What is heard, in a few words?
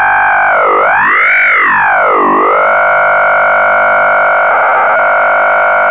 400 BPSK; oh2aue